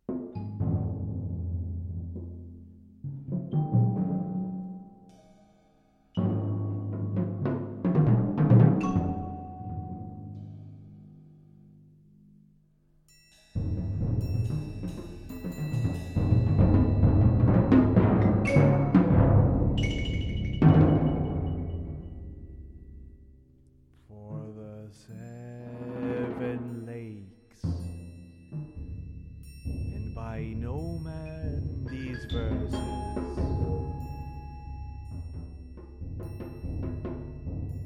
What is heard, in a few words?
chamber setting quarter 72